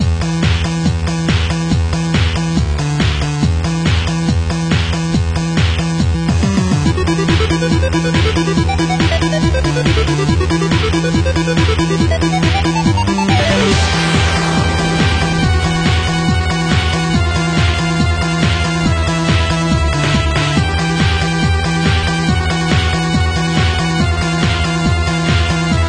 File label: Aggressive; Super Metboy